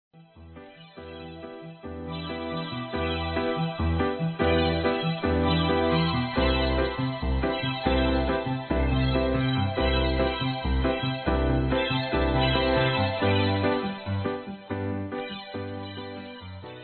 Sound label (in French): synthetiseur